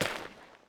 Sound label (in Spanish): Paso tierra; yesterday origins gamerip 2016 switch ps4 pc ios macos